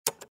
sound button

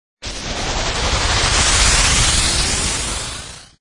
add speed

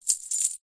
ADD SCORE

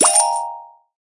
bet chip; goldvase

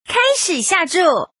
start bet; redvsblack

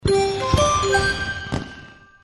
Big Win; goldvase

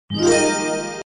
lightning; forestparty

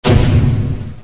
bomb; egyptslots

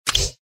jetton; longhudazhan

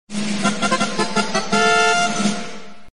sound win

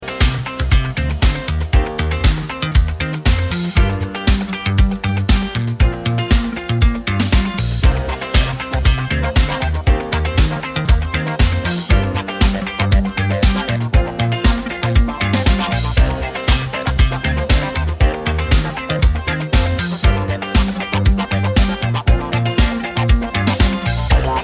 bgm normal; cardtiger

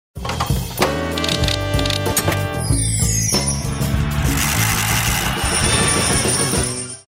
tiger selected

